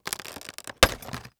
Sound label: Foley Plastic Package Pop Open